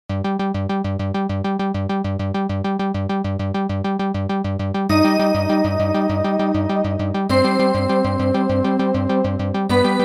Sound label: fanfare for fall